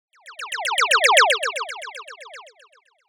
sound design effect flying beeps; sound effects one

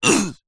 human male short fight vocalisation

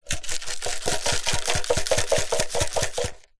noodle pot dried plastic shake

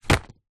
household rubber glove drop on wood

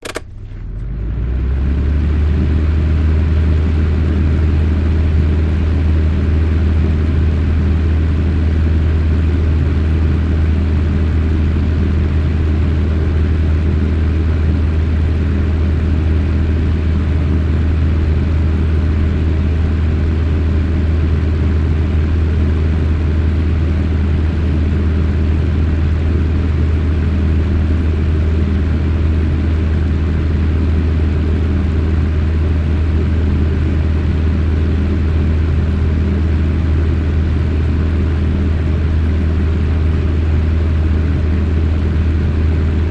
household heater portable fan switch on run off

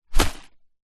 paper sheets dump into small plastic trash can; foley

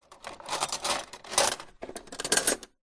cutlery touch light in kitchen drawer; household

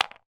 game plastic house or hotel drop on playing board; monopoly

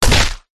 human punch smack crunch; zapsplat

